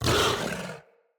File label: Kubrow Feral Vocal Angry Attack Short